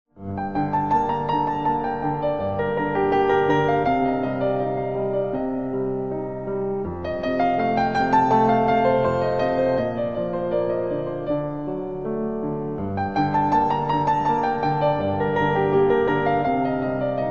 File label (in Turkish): SANTRAL MÜZİK